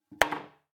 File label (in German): Kueche Sounds